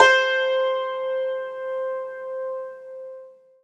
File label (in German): HACKBRETT C2; Alpine Volksmusik